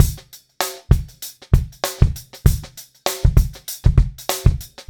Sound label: 098 Pop Drums; Pop Rock; Moderate Tempo; Pro Tools Essential Loops; Digidesign